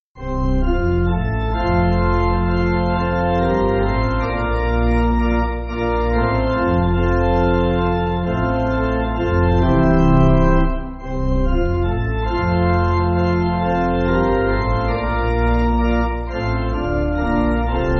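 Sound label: Pipe Organ, Backing Files for Churches; We Offer Our Child; English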